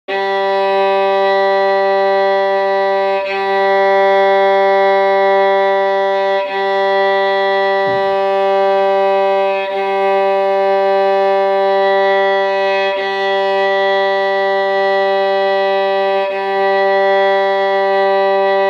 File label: G Tuning Note